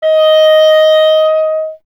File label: clarinet D#, Solo Instruments; Miroslav Vitous - Solo Instruments VOL-1; 90 s Sample CDs